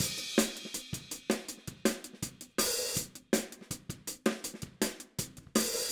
Hi-Hat, 162 BPM; Drums; Drums from the Big Room The Mixes; Take Your Toms Off; Verse

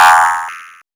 ARP2600; AMG - Vince Clarke Lucky Bastard

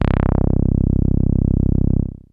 Bass Synths hits and octaves; FM 57 - The Ultimate Sample Collection (1997)

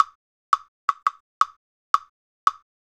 Wood Block; Sonic Foundry (Sony Creative Software); Joe Vitale Latin Percussion; Wood Blocks

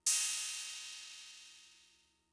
Cymbal, Fricke MFB-522, Drum Machines

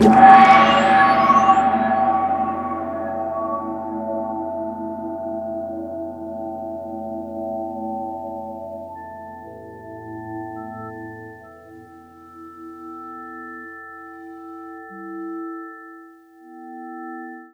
METALGONG; USB Soundscan vol.33; AKAI; Disjointed Textures; DRUMATIO

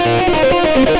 xtra lead

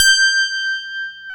PIA DX E-PIANOS

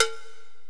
Korg x5, Drums

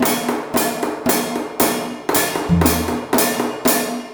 MALAY L, Heart of Asia

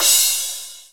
CYM CRA, Cymbals; AMG; Now CD-ROM (Roland); NOW! Drums

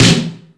All Snares, Drum Machines

